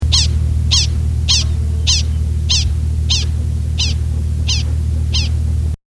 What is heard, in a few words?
Cheep Cheep Cheep